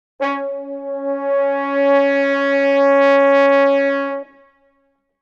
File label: horn, French Horn, ca. C# above Middle C; SPECTRAL TUNING; CDP